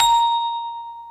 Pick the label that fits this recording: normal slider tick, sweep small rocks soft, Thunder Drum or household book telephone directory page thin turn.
normal slider tick